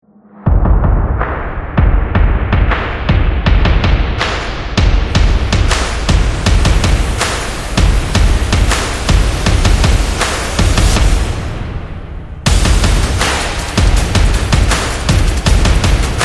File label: Action Stomps